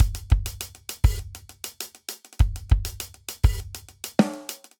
Linear Funk Breakdown 100 bpm, Drum; 4 4; MIDI Clips; Ableton; Factory Packs; Drum Booth

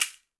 Caxixi Hand; Shaker

